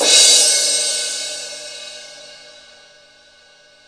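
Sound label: cymbal crash; Best Service Gigapack 2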